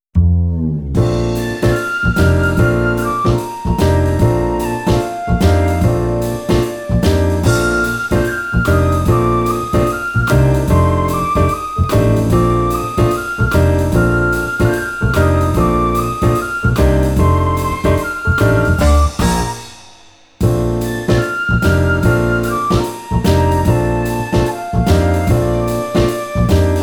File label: London Bridge Instrumental